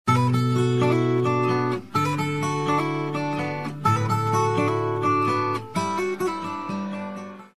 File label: GUITAR MELODY ALARM